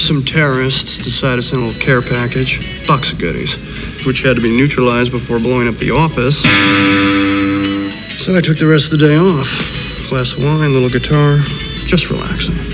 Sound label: relaxing